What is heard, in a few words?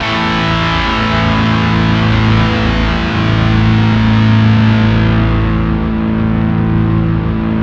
GUSH CRUSH, Bizarre Guitar; Spectrasonics; GUSHERS